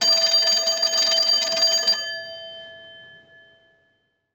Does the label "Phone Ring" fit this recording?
Yes